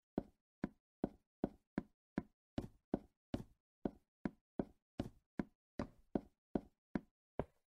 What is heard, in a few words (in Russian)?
shagi po derevu minecraft; zvuki hodbi